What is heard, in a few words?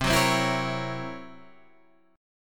C 7th Flat 5th Flat 9th C, chord